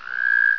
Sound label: croak short